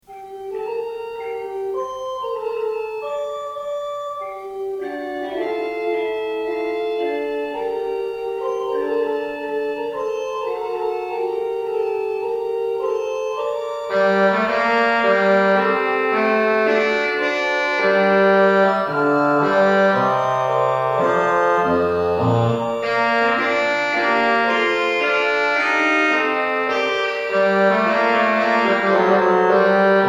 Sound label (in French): trompette; french music